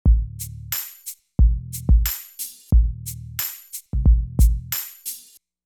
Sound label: drum